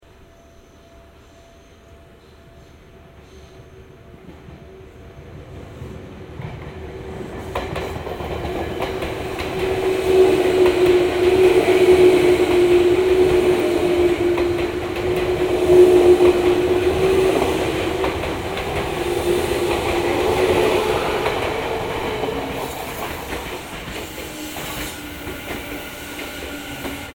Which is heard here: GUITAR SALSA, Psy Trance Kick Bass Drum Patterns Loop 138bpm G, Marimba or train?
train